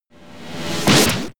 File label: reverse hit comedy